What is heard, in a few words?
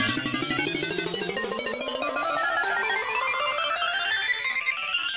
count Up Loop; wildotron 3000